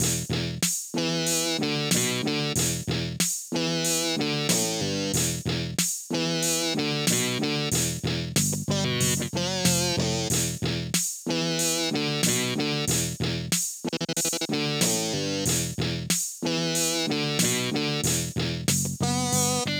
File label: Rock, Aircheck, Retro